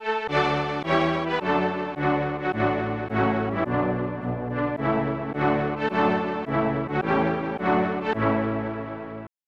Unused Jingle; Elite Soccer; SNES

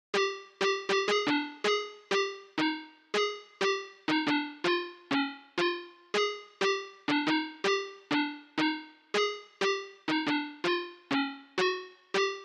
Melody; chippy

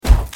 Spring run step; gamerip; the joy of creation halloween

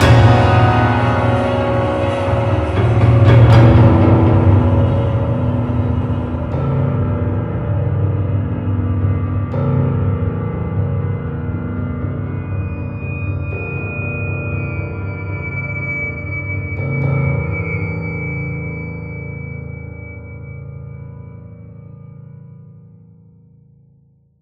trap wait For Signal; Perception; PS4

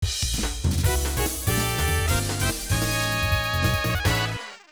Trial Shoot Finish; Golgo 13; namco system 12 arcade gamerip 1999

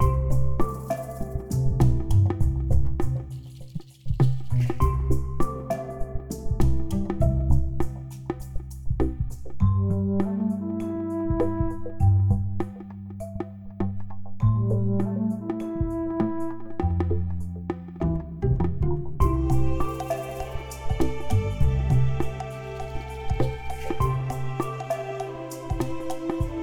Extra Beat Total; Toki Tori 2

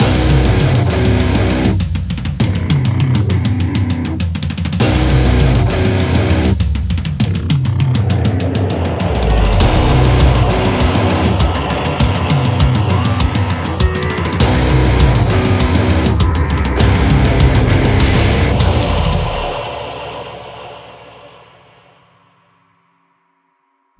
Music Song Intro; Hellbound

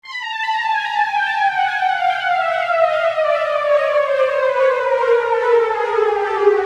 Falling; Hoggy2; PS Vita

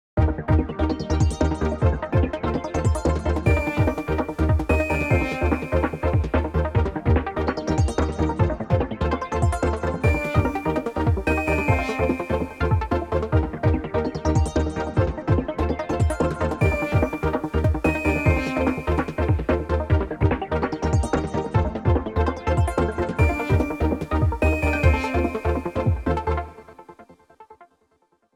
Music Loop Victory 146BPM; ps4; xbox series xs; SM2 Defis Void

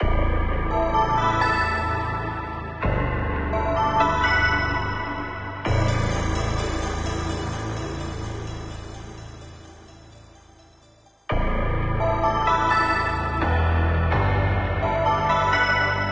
bgm hokora

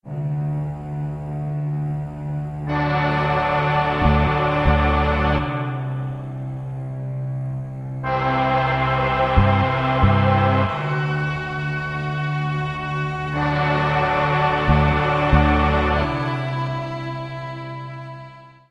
Victory; God of Blades; android ios macos mobile windows

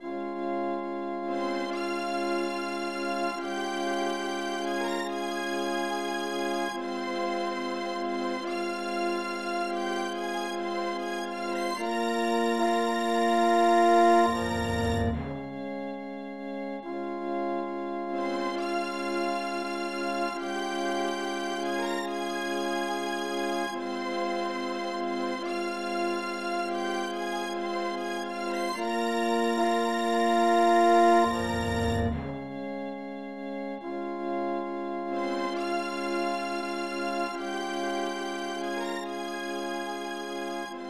Strings; super star wars

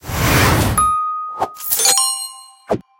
mission complete; talking tom gold run gamerip 2016

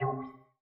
Cancel; PlayStation Classic; ps1

